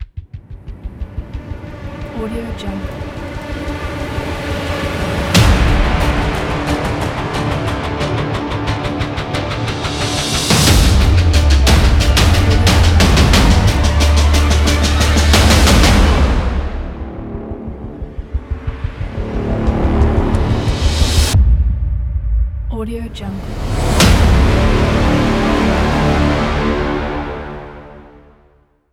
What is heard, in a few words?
agressive trailer ident